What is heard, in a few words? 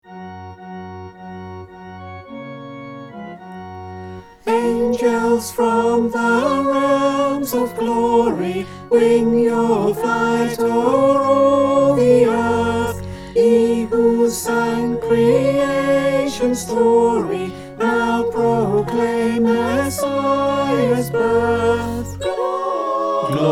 bass, choir